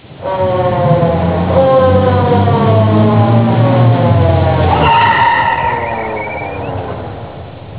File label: police siren